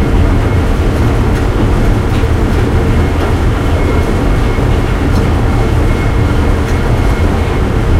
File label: panzer3 engine int rpm 33, tanks; engines; War Thunder